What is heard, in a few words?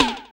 FX Dance; Sound & Vision; Gigapack II CD 2 (Roland)